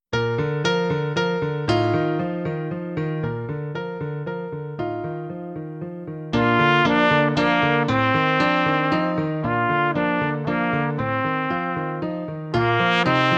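Springtime Waltz - Solo With Accompaniment, trumpet; SOE Festival Solos Book 1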